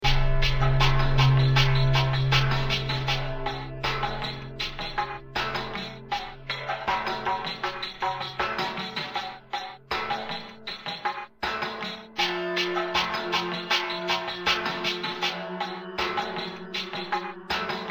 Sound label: Bonus Scratchbeat; analog demo music